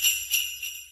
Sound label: jingle; garrysmod